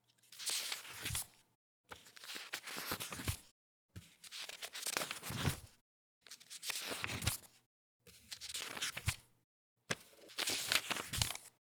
turn paper medium book

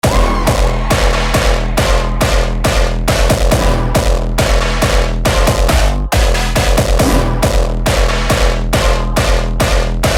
Hardstyle; отбивы

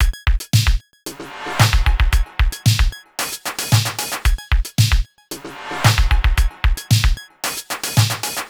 linn drum lm1 80 style; looperman

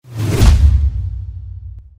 big whoosh

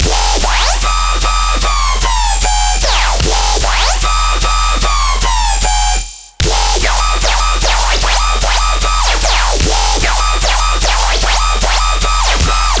dubstep drop beafy 150bpm; looperman

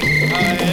LP loop, Vinyl static; polygoniix Intro Essentials pt2; Electronic; Glitches; NoiseCollector; voodoo

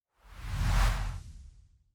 big whoosh

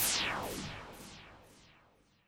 fx, Glitches; polygoniix Intro Essentials pt2; Electronic; Firus fx

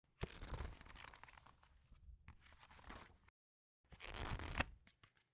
flip paper

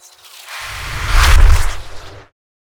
Epic Swishes; SwooshSounds-2

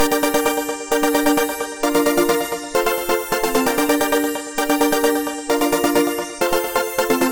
80s style synth pop riff; looperman

